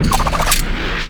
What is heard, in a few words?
Crew Ship Pilot Finisher; Railjack; Grineer; Cinematics